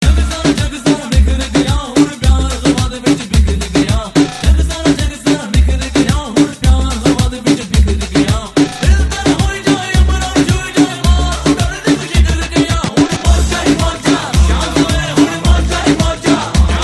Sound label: Hindi Remix Tones; JAB WE MET; Hindi Movie